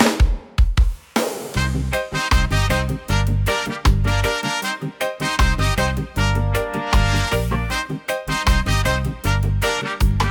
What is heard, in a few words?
Reggae